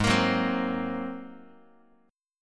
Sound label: G sharp 9th G sharp Guitar; G#9 strummed; x,4,1,4,4,x; JGuitar